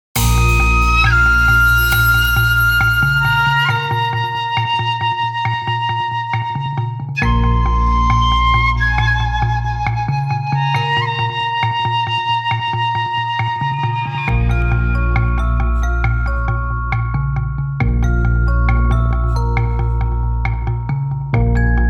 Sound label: Flashbang